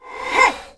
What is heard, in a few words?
summoner attack; propack